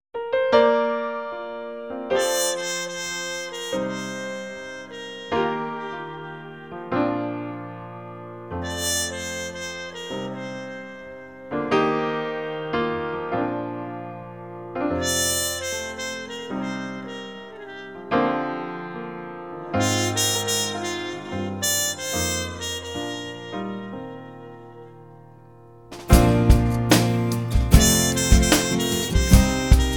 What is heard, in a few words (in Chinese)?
纪录片背景音乐